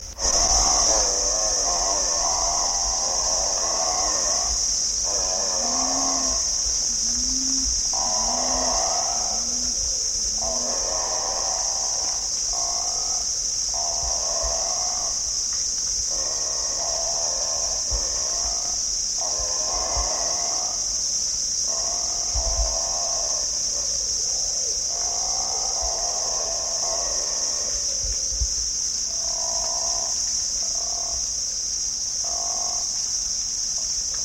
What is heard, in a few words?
Abbott's booby, پرنده; بوبی ابوت